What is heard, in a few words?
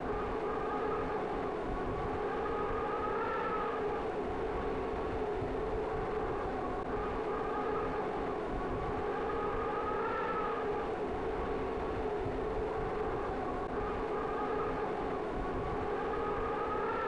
Wind; Fallout 1